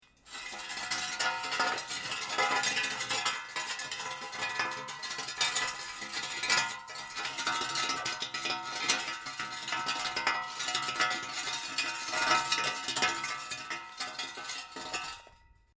Metal Rattle Grind Long, Metal drag sound, scraping a metal pipe; Metal drag sound, scraping a metal pipe along dirt ground